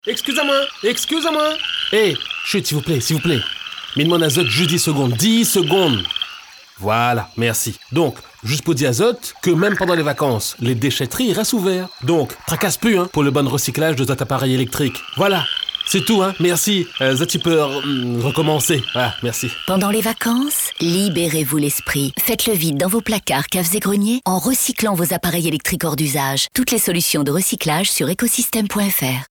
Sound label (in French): grenouilles la reunion